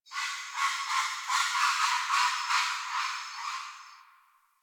Tree Critters Happy Calm; Ambience; Props; Warframe; Duviri

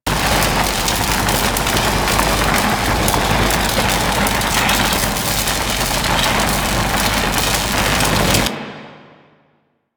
Elemental Breath Electricity Impact Loop; Warframe; Dragon

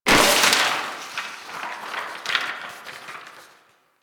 Desktop Book Destroy; Warframe; Ambience; Entrati

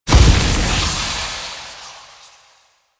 Shadow Smoke Death; Warframe; Duviri

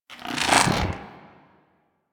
Head Pull; Warframe; Teralyst; Ropalolyst; Flight

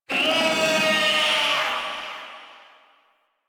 catbrow feral vocal spawn; Warframe

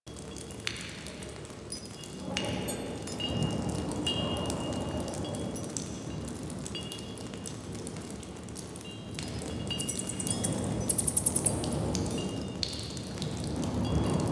Ambient Loop G, Village Exterior; Warframe; Ambience; Eidolon Plains; Ostron Village

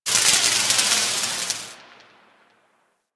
Electrical Spark; Ambience; Props; Warframe; Orokin

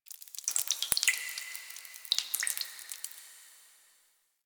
Ocean Cave Drips; Ambience; Warframe; Grineer Ocean

